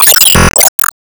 Enemy Factory Active Loop; Expansion ball Zap Loop